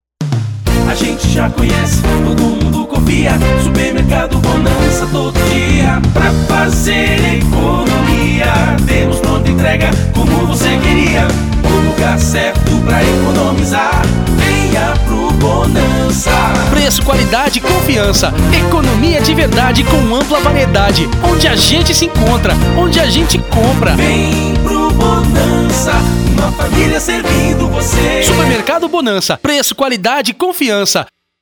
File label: Jingle; Supermercado Bonança